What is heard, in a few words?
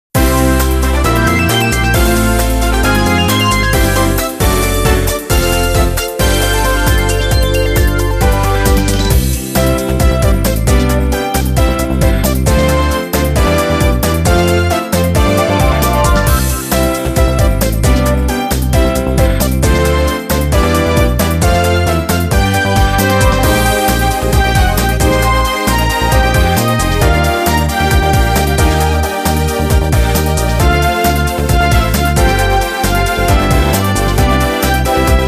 Music Menu event